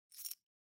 Time Recorder Keys Noise

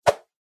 Motion Swish

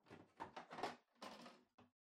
Air Purifier Far Tank Remove

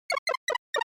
SNES RPG Magic Status

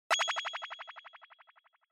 Onoma Surprise Low Long

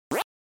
Arcade General Pitch

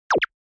Motion Pop

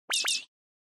Onoma Surprise Low

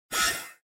Cyber

